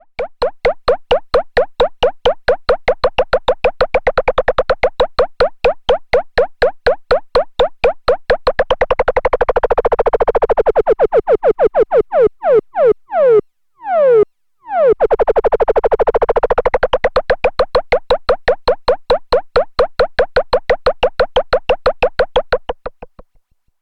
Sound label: Korg volca modular Generative